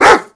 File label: animal dog bark alt; Call of Duty Modern Warfare